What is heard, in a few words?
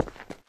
concrete, player footsteps; hl2